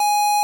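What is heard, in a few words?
pico G#4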